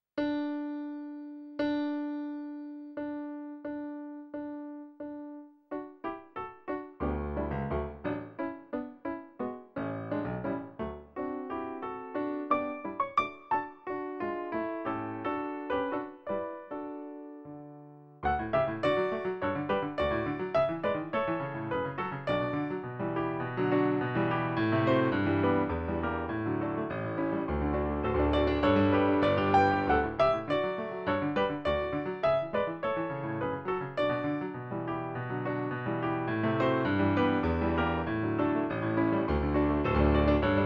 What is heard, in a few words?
piano; Zigeunerlieder 03; Brahms